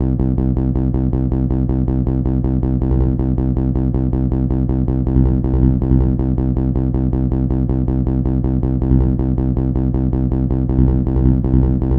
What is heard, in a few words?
gro 160, Hardcore Loops, Bass Loops; Dance Synths